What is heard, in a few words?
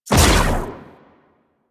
Pulse Laser Shot; Railjack